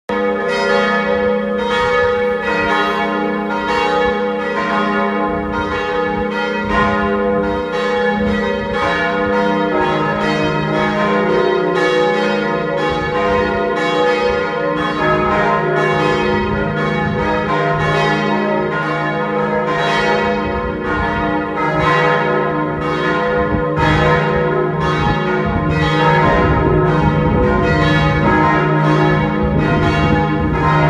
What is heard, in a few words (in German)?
Glocken der Peter Paul Kirche